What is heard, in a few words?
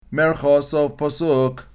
marka sof pasuk, cantilation